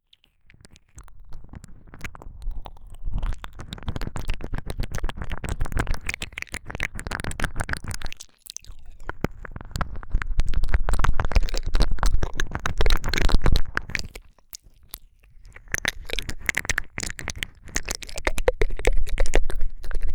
激しく責める耳舐め, ASMR

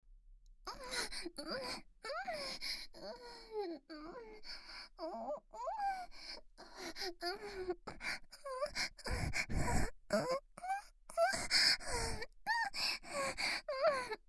あえぎ; ママサキュ

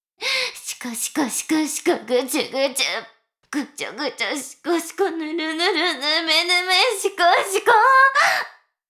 しこしこ音声; 牧場戦腺; 少尉

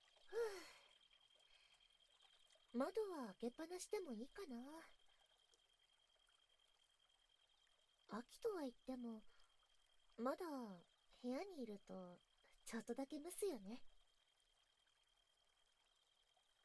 それじゃあ耳かきしてあげる♪(右耳)

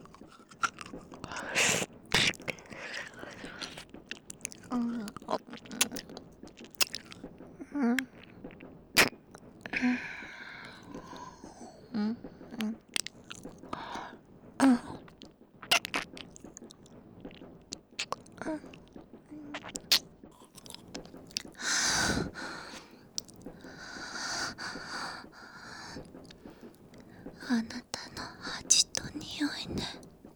耳舐め; 耳舐め逆レイプ; 匂いフェチの痴女にねっとり逆痴漢された話。～逆痴漢で快楽堕ち～; バイノーラル

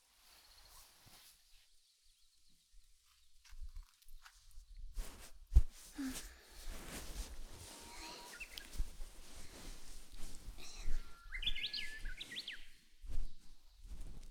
散髪 ドライヤー; 裏庭散髪

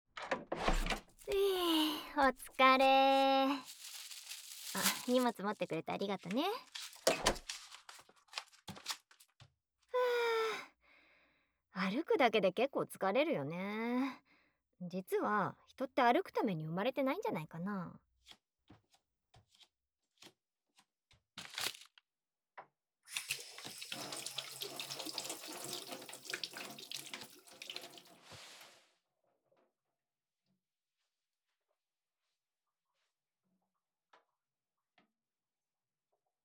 悪戯耳かき（右）, ASMR

